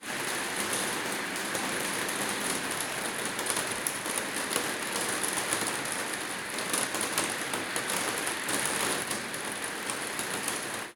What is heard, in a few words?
LIQUIDS - Hard rain, plexiglas ceiling, inside, hard drops; Game Audio Factory; Liquids Materials